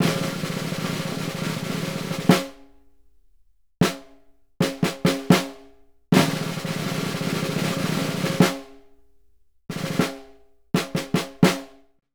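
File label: snare, Synclavier Percussion; ILIO; Synclavier Percussion 2; World & Orchestral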